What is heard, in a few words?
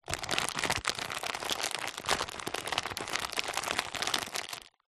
plastic thick packaging handling; zapsplat; foley